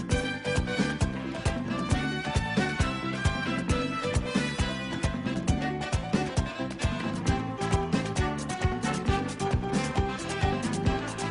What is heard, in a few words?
Merengue